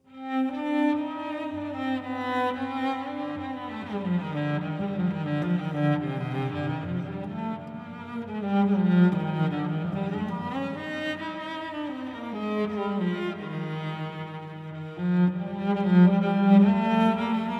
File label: bourree II Bach cello suites; musiceveryday